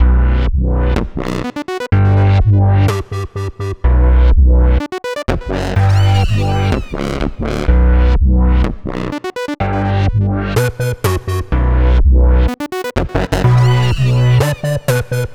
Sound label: Full Mix, Bm, Complex Electro Synths; Precinct13; Prime Loops